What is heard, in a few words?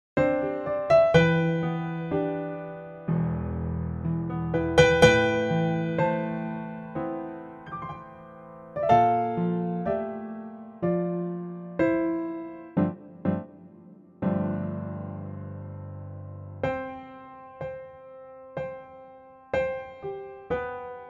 C Db D piano